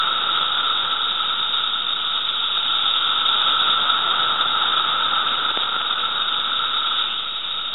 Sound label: M cassini Chorus, magicicada cassinii, 17 year cicadas; Brood II; magicicada cassinii fisher 1852